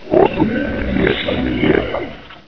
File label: death; quake2; ranger